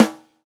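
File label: snare, Roland MV8800 drum kit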